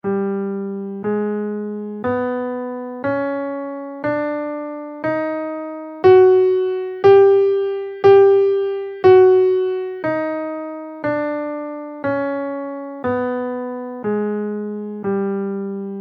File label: puravi scale in G, guitar